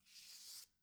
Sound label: pick up deck